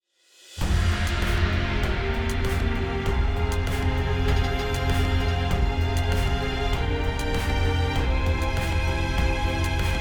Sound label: Villain Win